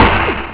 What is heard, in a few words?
snare; OctaMED SoundStudio V1